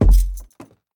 Footsteps Wood Walk; Devour; The Town

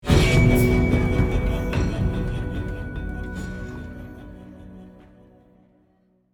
Demons (Stinger)

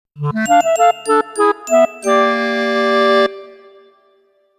BGM Player Lose

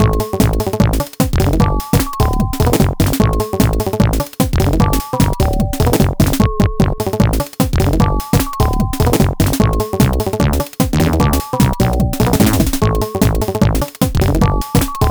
Music (Lame Bells); Mario 4 a Space Odyssey; Genesis

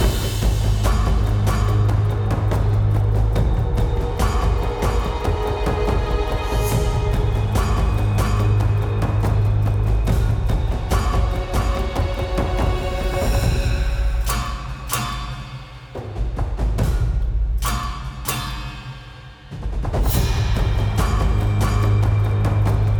music combat calm

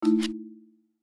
Swap Failed; My Cute Commissar

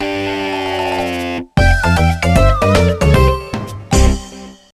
Lose; Let's Golf 3D

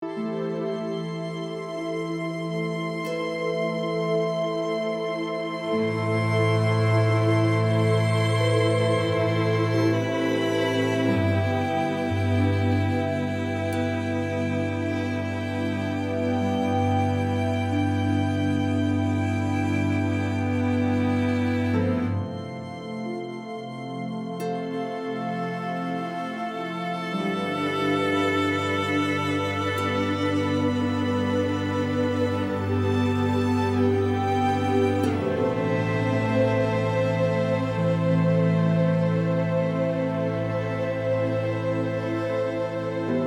Sad Loop

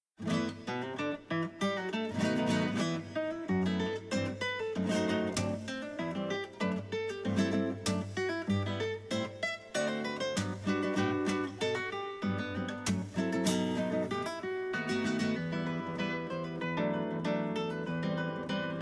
Guitar

